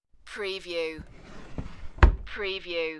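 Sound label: Drawer Shut; Sounds in the home of drawers being open or shut.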